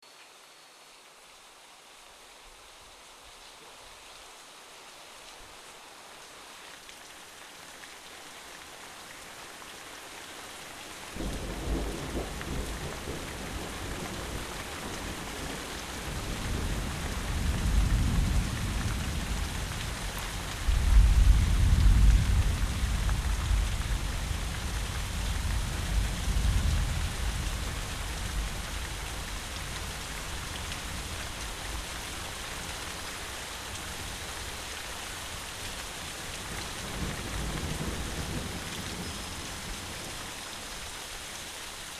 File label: Rain